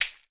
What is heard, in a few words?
CAXAII, Percussion; Voice Crystal - Steve Reid Definitive Percussion; BRAZIL SET